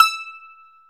BAGLAMAS E 5

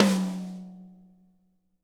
tom, drum

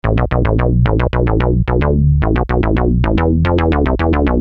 Syn Bass 110 Bm; Sonic Foundry (Sony Creative Software); Techno Synth Loops 1 Signals I

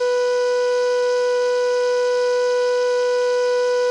CALLIOPE B2; Sonic Reality; American Heartland

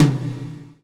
GATE TOM, Drums Acoustic

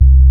Sub C1; Proteus 2000; Multisamples; Digital Sound Factory Proteus 2000

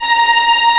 Vio Trem 16 A#4; Multisamples; Digital Sound Factory Virtuoso

